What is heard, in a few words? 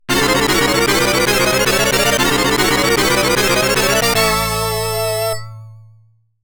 Game Start; Taito Digital Sound Archives Arcade Vol.2